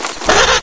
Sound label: peng deploy